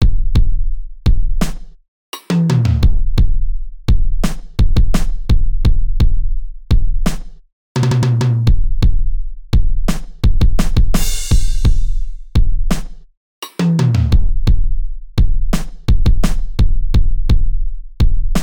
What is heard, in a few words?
F Sharp Minor Drumtrack